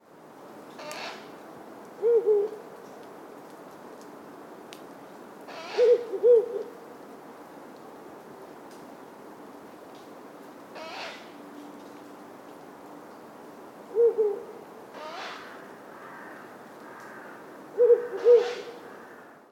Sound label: Ural Owl